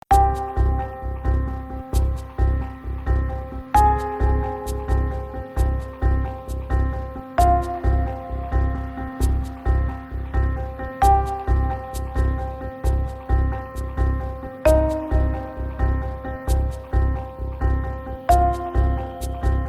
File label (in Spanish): Música Cinematográfica de Intriga Y Suspenso